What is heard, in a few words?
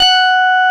Korg CX3, F#5; Back In Time Records; Korg Universe VOL-1